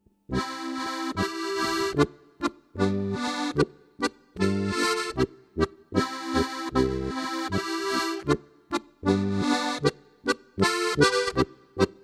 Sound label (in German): Ennstalerpolka; Steirische Harmonika